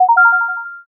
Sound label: success